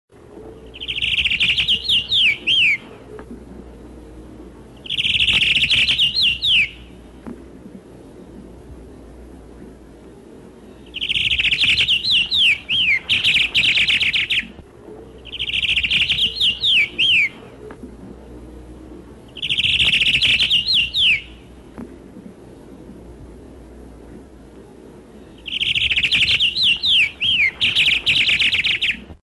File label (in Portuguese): bigodinho; fauna